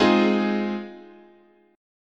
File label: G sharp 6th, chord